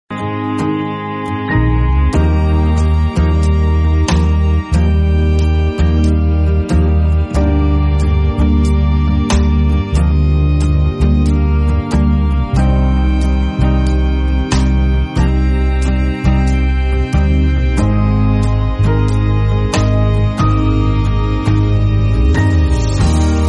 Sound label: Song Instrumental